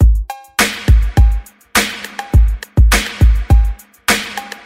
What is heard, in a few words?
102.9 g sharp old school hip hop; bpm; drum loop